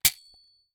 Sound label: Toy Gun Fire Dart; foley